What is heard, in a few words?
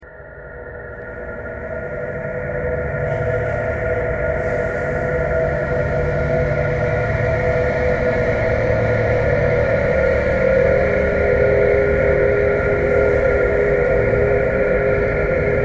traffic core birds thread buzz